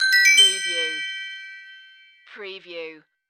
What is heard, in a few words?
Multimedia Chime